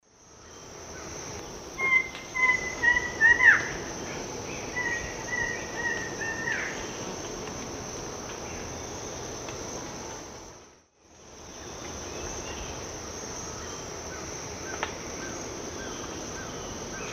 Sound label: Thamnophilus murinus, duet; S. America; Eastern Lowlands